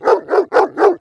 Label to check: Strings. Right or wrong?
Wrong